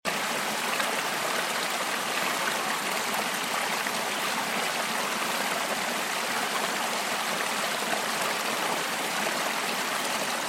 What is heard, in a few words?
babbling brook sounds